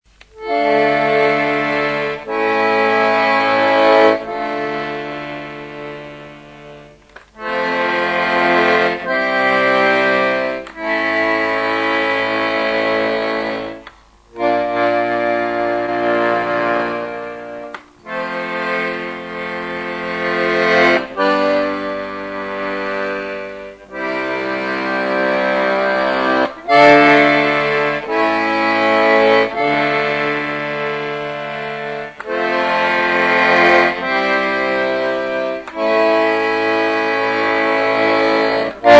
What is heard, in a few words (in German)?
Hymne